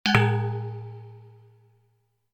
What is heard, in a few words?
UI Quirky